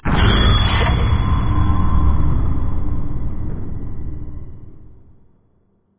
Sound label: advisor blast; ambient; source engine; ep2; outland